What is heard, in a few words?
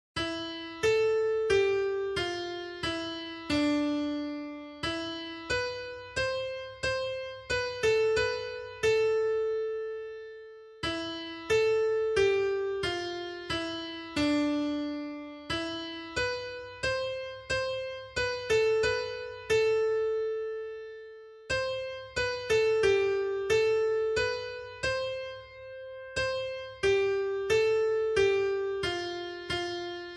PASSION CHORALE